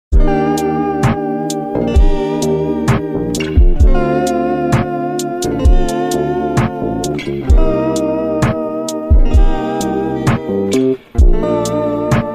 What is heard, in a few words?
Chill Looped